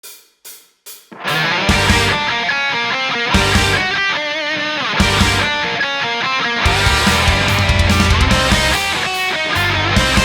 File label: Library Piano, Rock Cover; Luigi's Mansion - Dark Moon